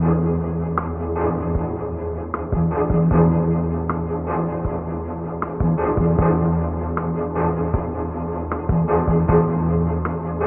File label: strings, Loops, Sad